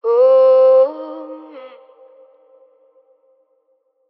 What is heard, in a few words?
Ad Lib Wet, F Min, Vocals; Cymatics - Eternity Sample Pack; Ad Libs